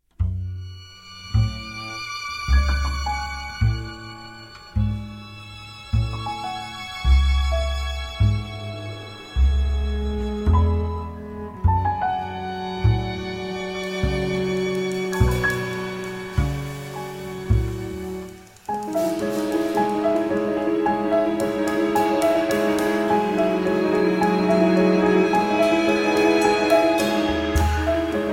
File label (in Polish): Kwartet Smyczkowy i Trio Fortepianowe